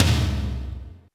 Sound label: Yamaha RM 50 Toms